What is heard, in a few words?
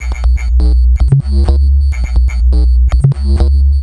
Subb Glitches, Bass; Sonic Foundry (Sony Creative Software); Underground UK House & Electro